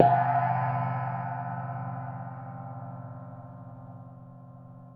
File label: GONG, percussion; drum